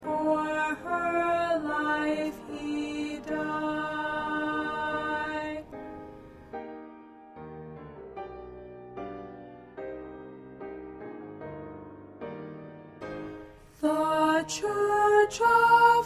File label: Soprano 1; Soprano 1 part